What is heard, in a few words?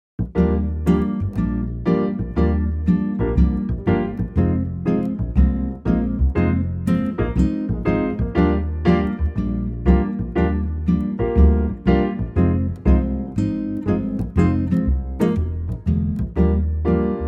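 Eb guitar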